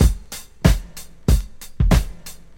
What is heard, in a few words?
93 bpm modern drum loop g sharp key, Free drum loop - kick tuned to the G# note. Loudest frequency: 663H; drum loops